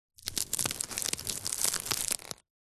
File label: basket movement stress; foley; zapsplat